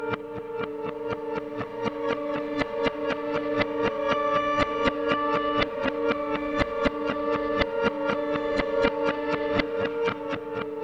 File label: FLUTTERING, Bizarre Guitar; Spectrasonics; MYSTICAL